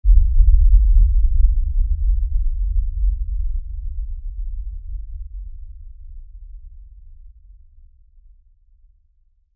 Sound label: Impact Lfe Rumble; Low Impacts; Sound Effects:Impacts; Blastwave